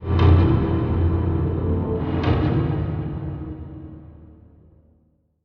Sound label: music - ambient mood short; You Are Empty; totalitarianism - ambientmood2